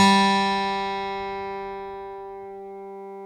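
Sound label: GTR G3 AC; Synclavier Sampler Library; KEYS AND GUITARS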